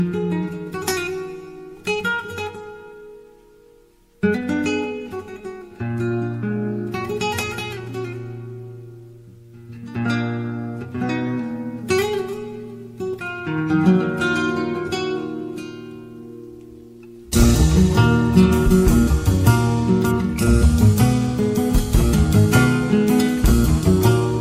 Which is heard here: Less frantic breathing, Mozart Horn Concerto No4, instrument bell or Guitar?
Guitar